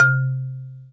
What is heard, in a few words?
MARIMBA C2; Greytsounds; Scott Peers Sound Engineering